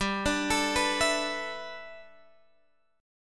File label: G 6th Add 9th, Guitar, Open G